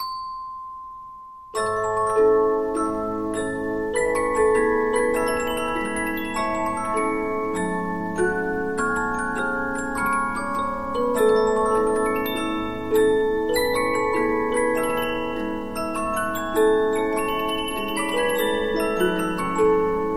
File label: Polyphon, 15inch disc musicbox